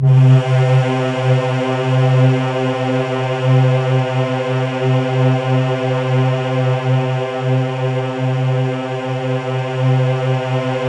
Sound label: C3, Synth Leads